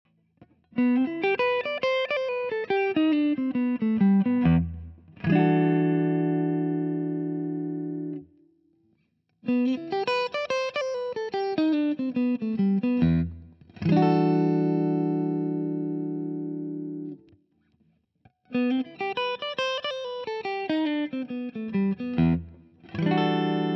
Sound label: Nashville Tele PU Positionen Jazzy; Fender PP